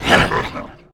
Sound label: Monster Ghost type Attack; Inotia 3